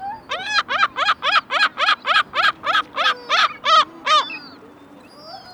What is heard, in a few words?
Lesser Black-backed Gull, Larus fuscus; gulls terns